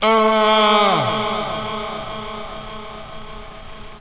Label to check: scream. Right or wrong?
Right